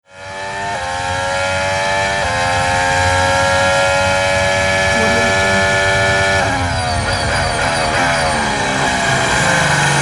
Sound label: race car engine roar on formula 1